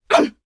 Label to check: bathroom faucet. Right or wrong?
Wrong